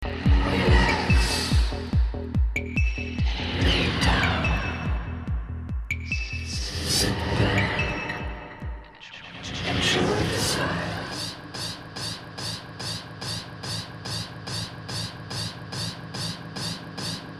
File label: Taiko, progressive